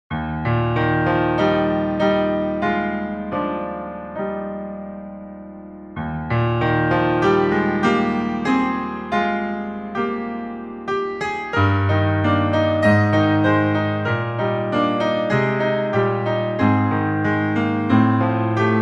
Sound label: Piano Solo, Original Key Eb; Fairest Lord Jesus; Hymn Charts